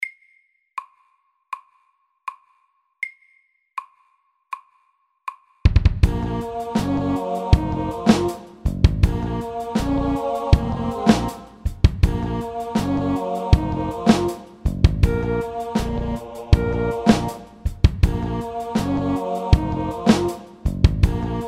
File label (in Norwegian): Octave rap FORT